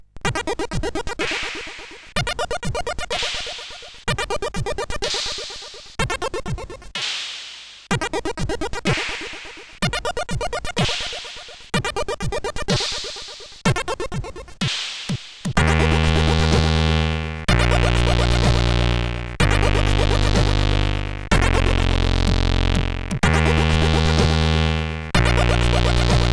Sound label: Compotune, sid CSG8580R5